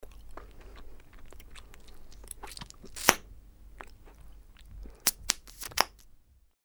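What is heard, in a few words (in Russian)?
bubble gum bubble pops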